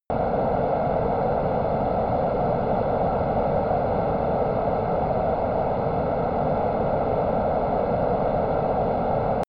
military jet eurofighter typhoon turbine steady distant